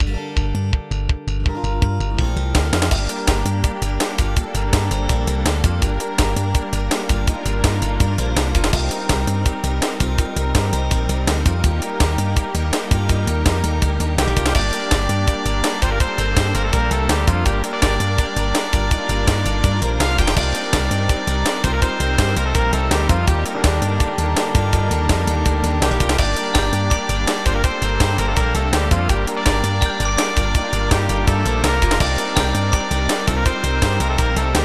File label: Combat Swamp; backpack hero gamerip 2022